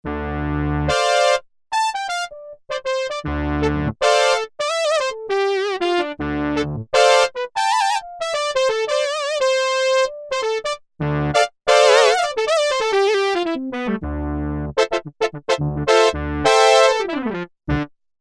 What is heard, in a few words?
BEND BRASS, Korg DW-8000; Factory Patches